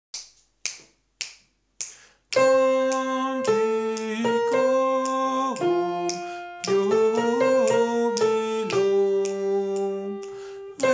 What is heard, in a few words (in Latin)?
canticorum soprano